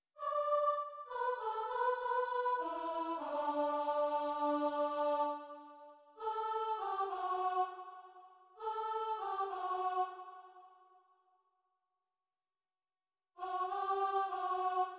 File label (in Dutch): langzame gedeelte zang stem 3